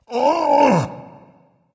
grunt lift dragon; fafnir

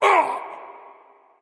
grunt; Smite; bacchus

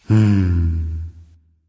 content, voice; Smite; ymir skin12

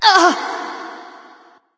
ouch light; smite; voicepack; hel

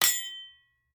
Sickle Hit; smite; izanami

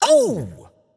yay; smite; shiva

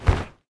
step standing; smite; god fenrir

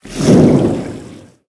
cyclone; smite; poseidon

